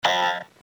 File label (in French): dang regle cartoon